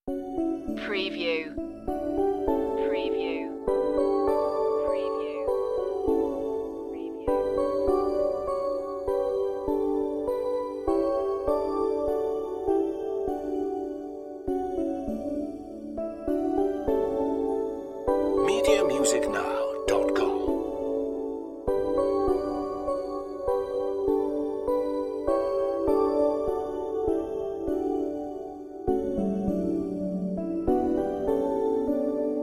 bells keyboards organ

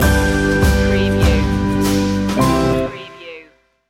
walking together stinger

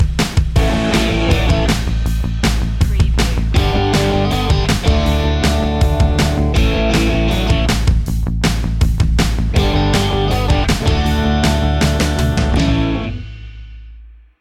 moving forward

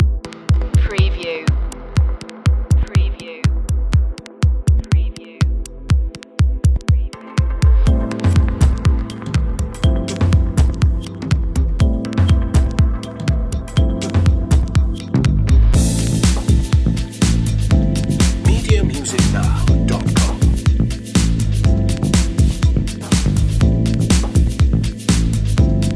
underscore

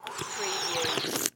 Slurp Drink Male; sound effects bible

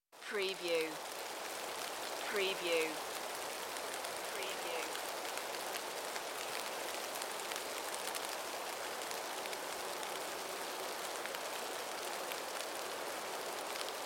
Forest - Heavy rain; Beddgelert Forest; beanstalk audio

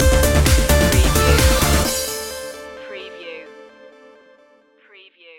electric anthem stinger

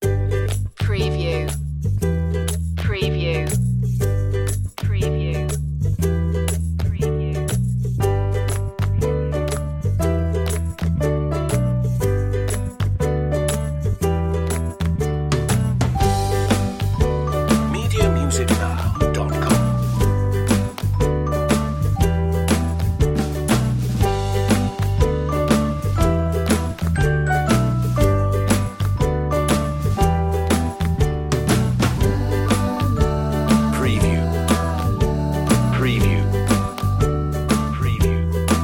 ukulele commercial cheeky chap

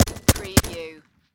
Machine Gun HKG36

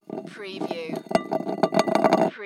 Can Tin Empty Set Down; sound effects bible

